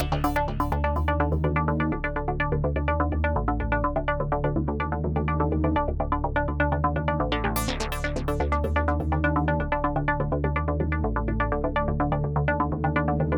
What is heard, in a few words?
techno